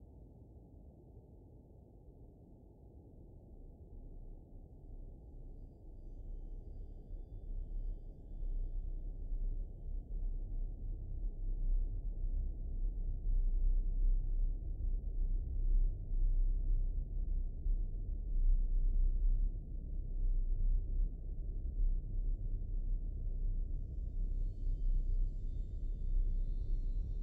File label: Ambience; Going Under; Styxcoin